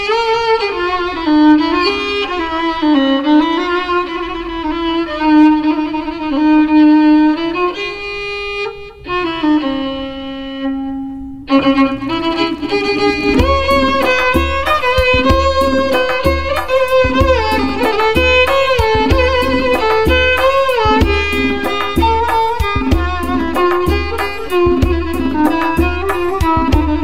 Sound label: Violin Tonbak